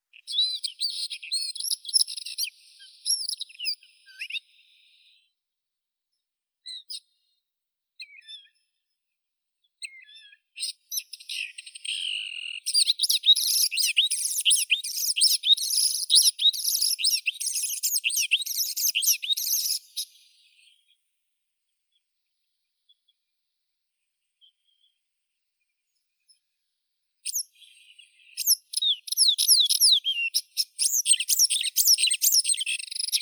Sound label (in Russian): kamishovka indiiskaya acrocephalus agricola